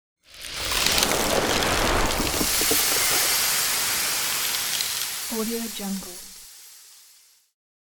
ice reveal effect; transitions and movement; خرد شدن یخ

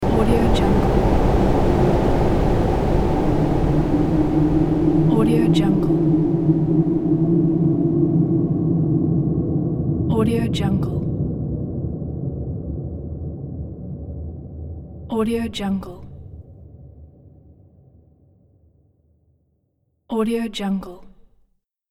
storm winds; طوفان باد; storm winds of the past

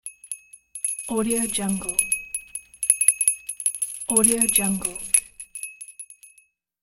small bell makes rattly ring; cartoon; cartoon sounds

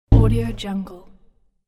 snowball hitting window from indoor; domestic sounds

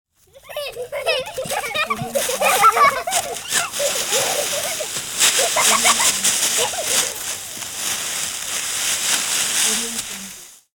children laughing playing in leaves; خندیدن بچه ها; human sounds

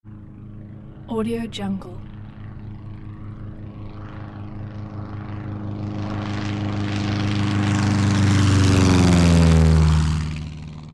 small single prop plane passes by; game; هواپیمای تک ملخ

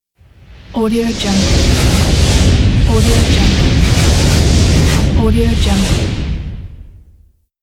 whoosh; transitions and movement

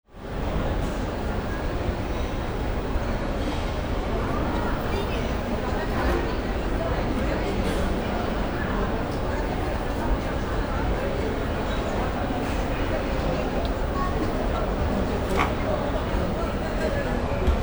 cafe in a shopping mall

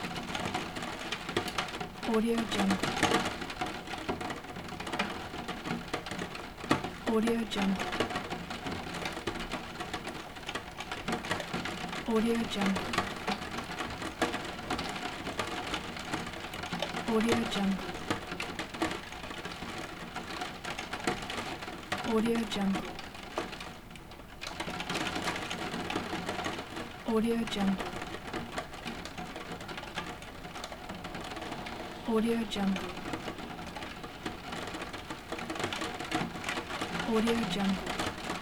rain on window; باران روی پنجره; nature sounds

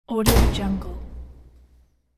heavy metal door close; domestic sounds

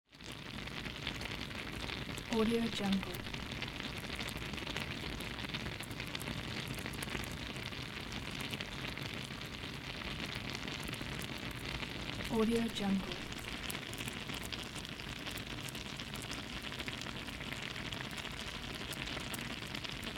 porridge cooking; domestic sounds

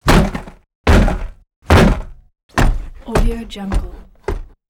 cars tires tire bounces series down road; urban sounds

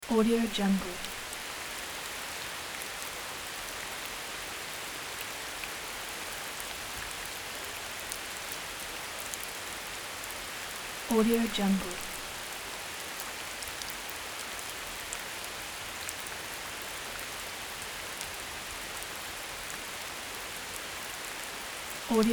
rain thunderstorm forest calm subtle

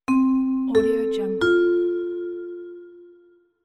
airport announcement ding; urban sounds

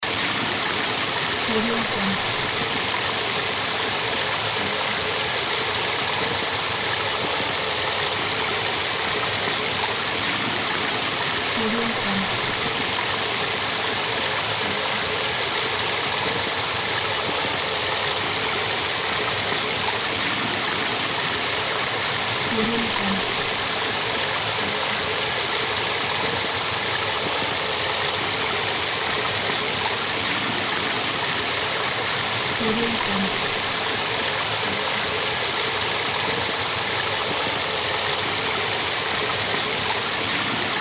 water loop; رودخانه پرآب

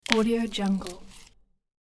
broad bean pod crack; domestic sounds

